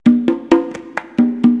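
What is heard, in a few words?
conga, loop, percussion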